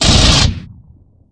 SMART FIRE, weapons; svencoop; aliens

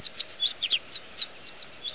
bird

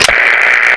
radio fire; svencoop; weapons

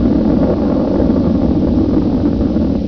oven

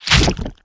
spit hit; weapons

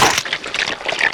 flesh item; svencoop

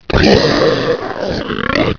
zombie alert

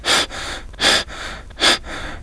outbreath; firearms

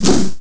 li attack; svencoop; licker

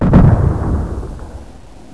big gun

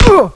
pain vest shot; firearms

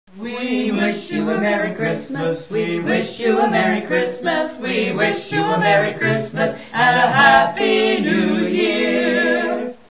xmas; cstrike; rooka

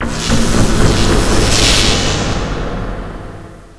bolt start; wizardwars; spells

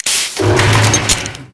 insert key; svencoop; ambience

